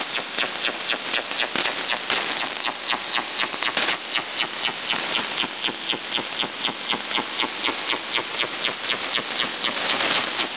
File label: websdr recording 1916.8kHz